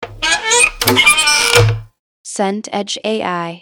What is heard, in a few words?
door wood open